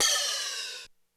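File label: Hi Hat Open, Korg DSS-1 Drums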